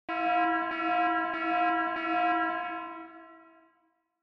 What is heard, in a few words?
Warning Siren Reverb Fast Mid